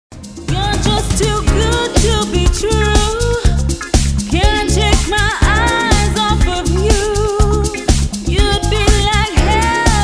70s, dance band

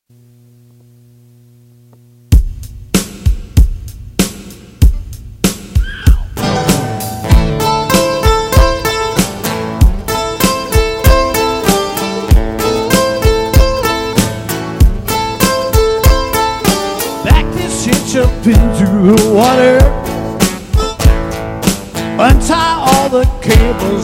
Acoustic Band

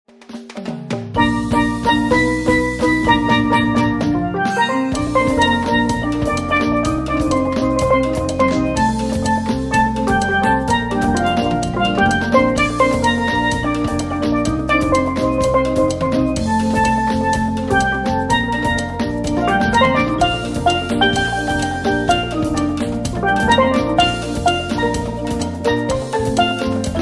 Bailando La Soca, Steel Drum

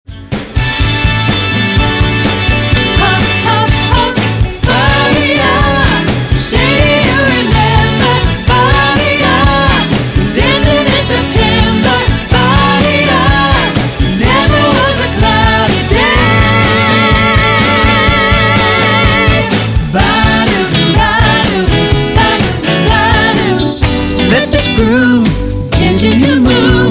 Funk and Groove Medley

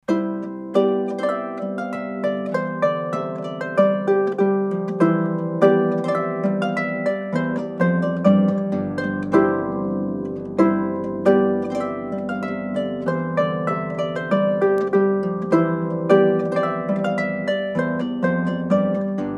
Trumpet Voluntary; Harp; Purcell

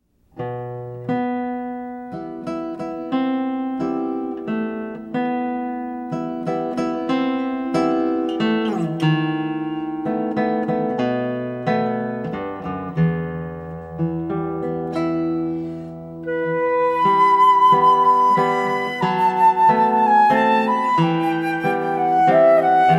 Flute Guitar

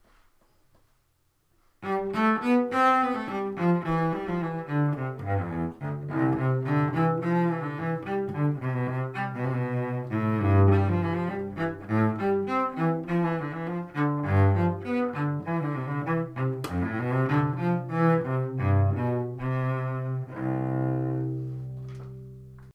Solo Cello Allemande; classical string quartet

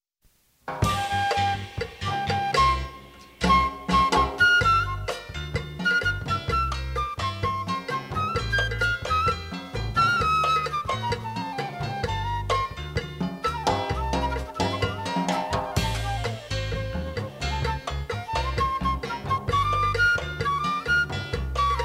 Latin Medley; Big Band

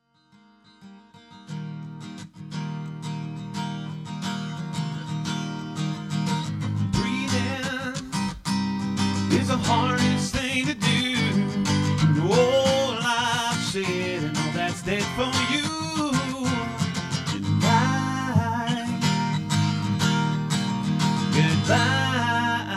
acoustic guitar

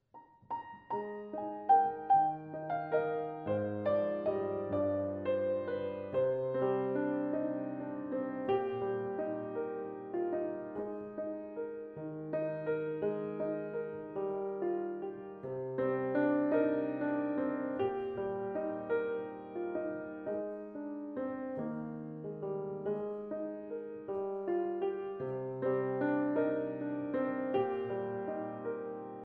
piano only; Classical

